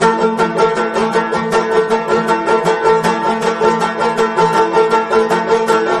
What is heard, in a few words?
banjo; music